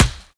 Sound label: mud, bullet impacts; physics; Half Life Model Pack